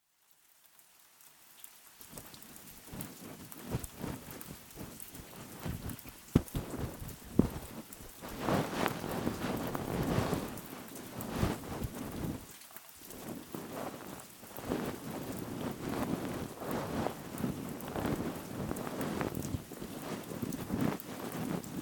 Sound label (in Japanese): 耳マッサージ、耳かき音 台詞無し雨音